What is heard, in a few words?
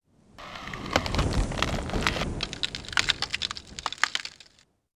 Tree Crack Snow; ambience